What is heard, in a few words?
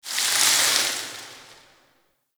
Tower Branch Disturbance; The New War; Ambience; Cetus; Unum Tower